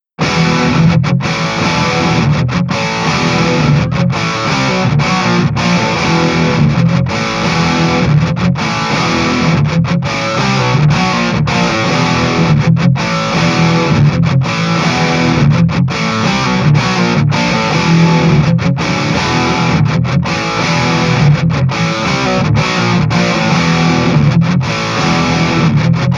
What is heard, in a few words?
JCM + Mesa EQ V, Real Amps